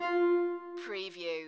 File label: musical, Button / Menu Sound, multimedia sound